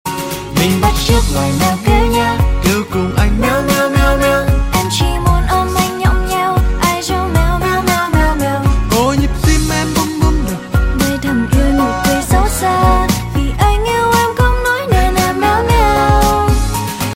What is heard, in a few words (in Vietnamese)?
hoc tieng meo keu